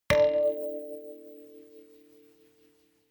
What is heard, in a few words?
notification kalimba rattle body hit